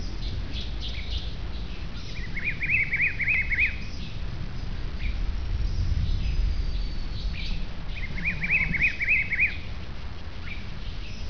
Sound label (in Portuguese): sons de ave